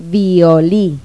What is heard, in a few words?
VIOLIN; Pipo-Aprende musica con Pipo